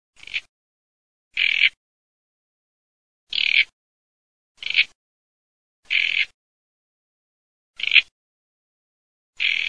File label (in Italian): CANNARECCIONE